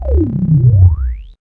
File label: SUBSWP